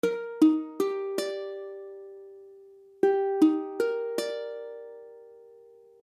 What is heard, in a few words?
Ebmaj7, ukulele; ukulele akordy